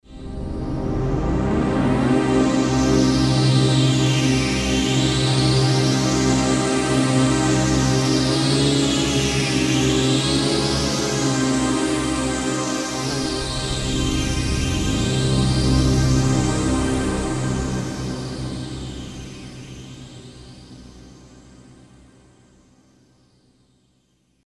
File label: Portamental, Roland JD-990; Vintage Board